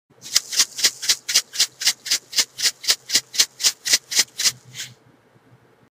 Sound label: Salt Shaker